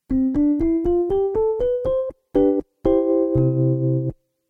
Pianet T

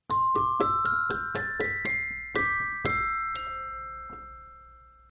Toy Piano Glock

